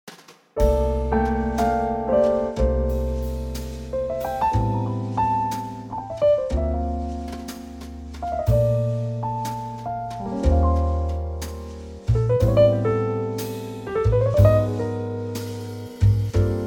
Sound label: F Trio